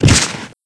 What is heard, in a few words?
Body Impact